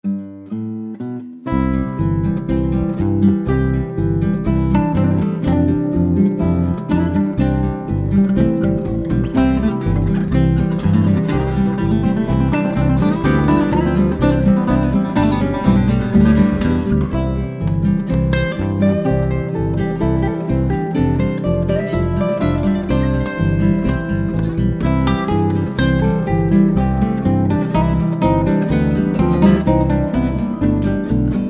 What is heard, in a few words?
study in C variation